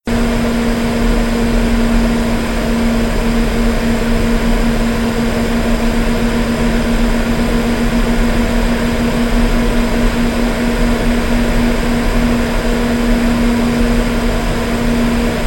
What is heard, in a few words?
Noise example